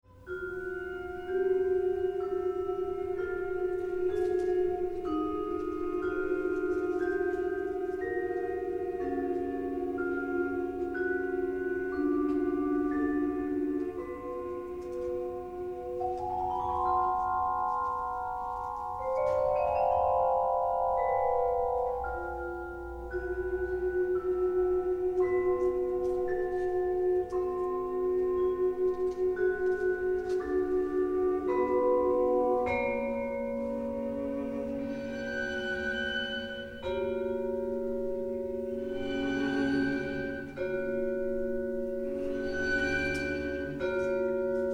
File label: Ominous Passage Orchestration; Bartok